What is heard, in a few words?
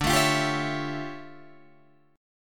D-Minor Major 11th, D, Guitar, Drop D